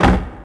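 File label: box, close; PC Gamer IT CD 38; thief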